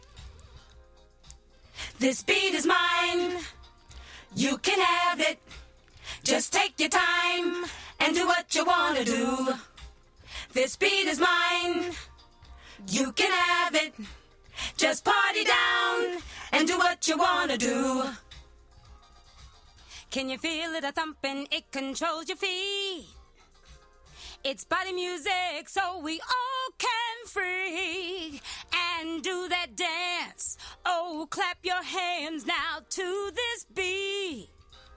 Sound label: Acapella